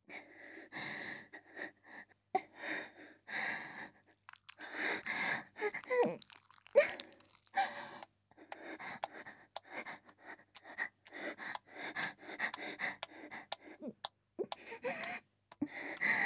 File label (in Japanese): 挿入 喘ぎ; SEあり